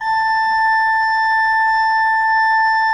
RFRP A4, Cathedral Organ, ROHRFLOETE; Propeller Island

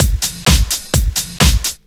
Straight Loop 128 BPM, House

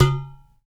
UDU, African

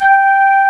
FLUTE PAD G4; E-MU Formula 4000 Series Vol. 3; Analog Odyssey; Synth Builder

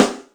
Bary rim, Yamaha DTXpress Acoustic Snare

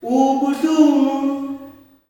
"OUU-PU", MALE; Spectrasonics; Heart of Africa 2; S.MALEAMB

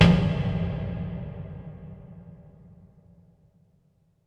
Hard Hit, impact drum hits; Sonic Foundry (Sony Creative Software); The Electronic Music Manuscript Collection

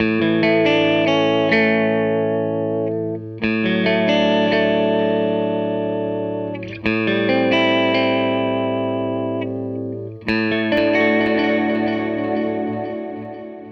Les Guitar Loop

